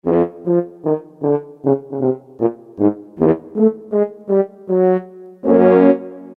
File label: FR.HORN; Roland SN-U110-06